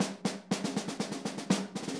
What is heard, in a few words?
120 March Fast Drums; Pop Rock